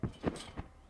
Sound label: trampoline realistic